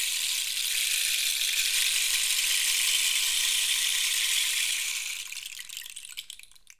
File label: RAINSTICK; AKAI S6000 CD-ROM; WorldSounds